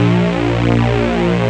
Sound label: el chord; ST-XX Amiga Soundtracker Sample Packs